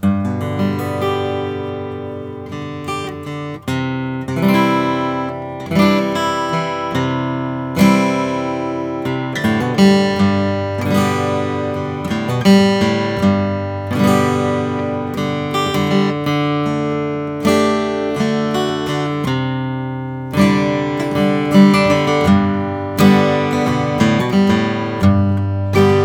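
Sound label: r taylor style 1 alpine spruce natural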